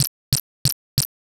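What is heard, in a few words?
footsteps grass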